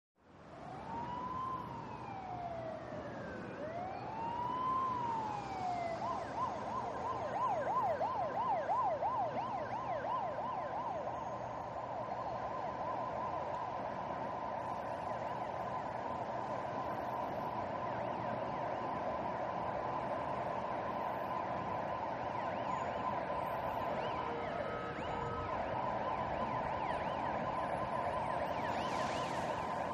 Siren; Distant Whooper In, Changes To Wailer By Medium Perspective, And Away To Distant. In Heavy Traffic. Siren Is Very Diffuse And Echoed.